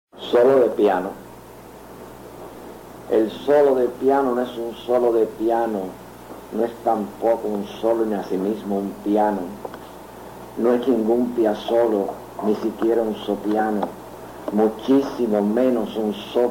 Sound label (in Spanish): Solo de piano